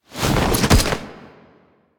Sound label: Board Flip; Warframe; Rail Jack; Boarding Rail Jack End